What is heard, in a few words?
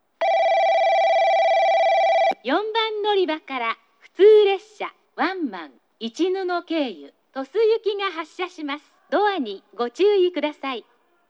nagasaki local tosu; 4番のりば発車放送（普通・市布経由 鳥栖行き）